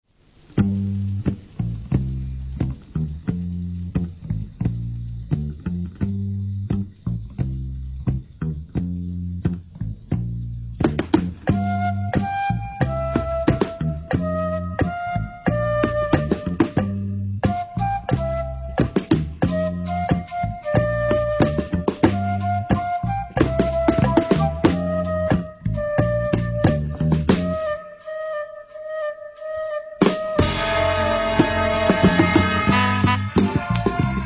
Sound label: flute spinning; エキサイティング・フルート